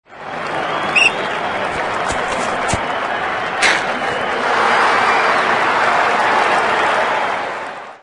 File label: football fx ringtone; motorola